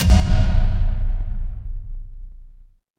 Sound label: transition impact hit